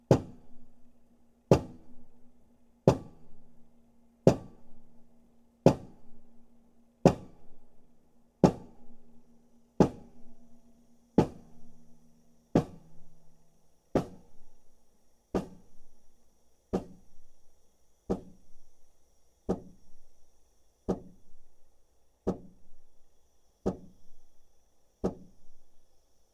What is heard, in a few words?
steel pile diesel hammer bubble curtain